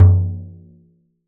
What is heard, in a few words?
tar; 96khz 24b mono; instrument de musique; Tar – fabrication Norb